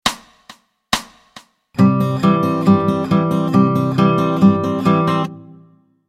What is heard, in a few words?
Travis Picking